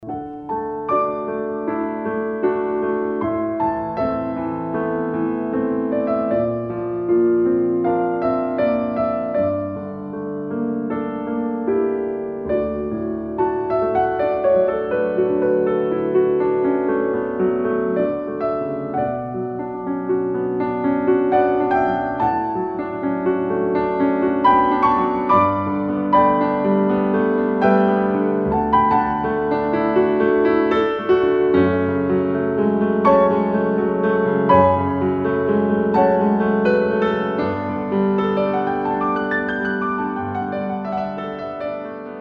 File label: piano version; FFVII AC OST